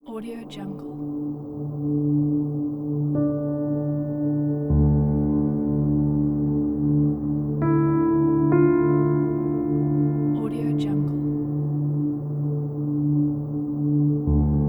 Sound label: melancholic atmosphere